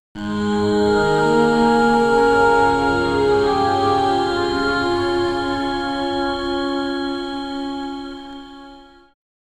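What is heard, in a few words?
cold melancholic score; 10s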